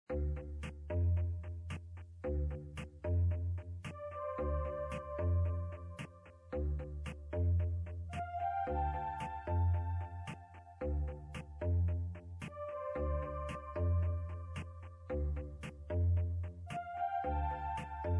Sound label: game Music Loop